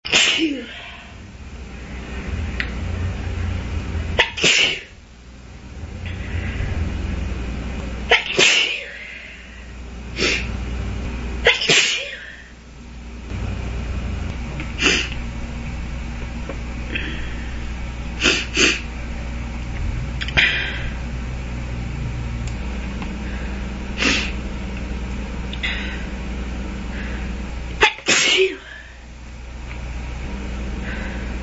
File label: long time no sneeze, female